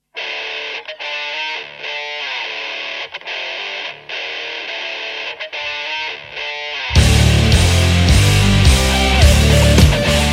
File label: guitar hard rock